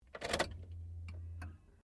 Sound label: CAR SUBARU LIBERTY INTERIOR POWER ON; Family members Subaru; Cars (Sound Effects:Vehicles)